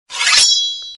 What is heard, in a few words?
Sword